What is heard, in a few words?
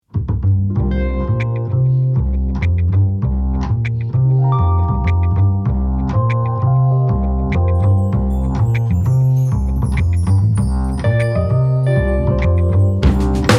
Bongo Smooth Jazz